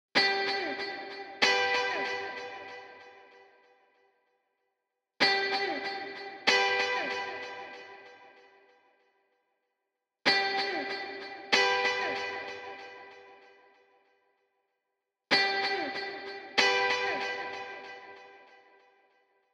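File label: 95 BPM G Maj High Guitar; Loop Stems; Cymatics; Menace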